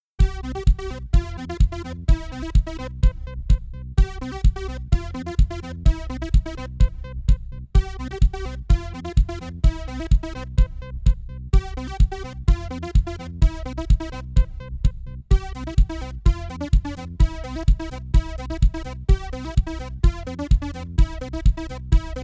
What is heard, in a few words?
fitness music, 432 hz; 127 BPM